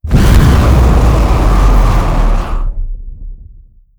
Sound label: dragon breath fire; Stellaris; guardians